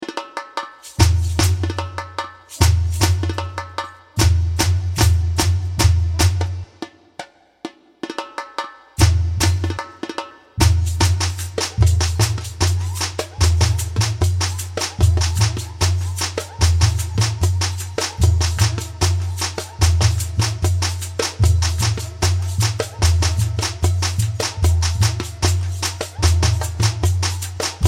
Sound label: Slow Samba; brasilian dance practice